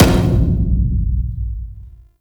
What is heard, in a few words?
Weapon Three Mech Hull C; Warframe; RailJack Weapons; Modular Weapons